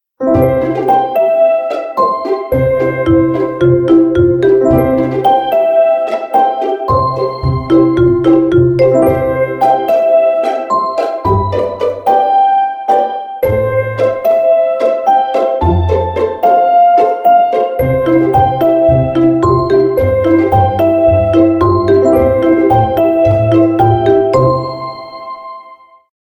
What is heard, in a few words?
Strings, ringtones; Gome; U7